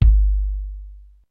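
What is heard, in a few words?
SP1200 kicks; DRUMS + PERC; EMU; Rubber Chicken Software Sample Bank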